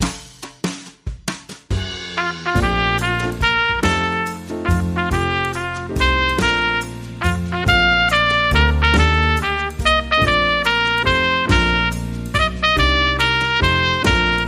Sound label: Jazz Waltz Trumpet Happy Birthday